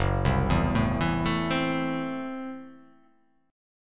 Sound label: F sharp Minor 7th Flat 5th, guitar chord; arpeggiated; JGuitar